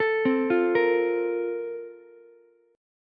Bb Major 7th Suspended 2nd, Ukulele; BbM7sus2 arpeggiated; JGuitar

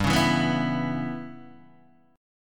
G 6th Flat 5th, Guitar, DADGAD

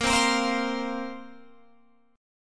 A sharp Suspended 2nd down Guitar Open F; A#sus2 strummed

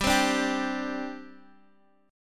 G Suspended 4th G down Guitar Open G

